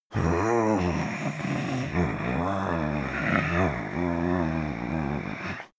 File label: male growl sleep; menschen; koerpergeraeusche; soundpool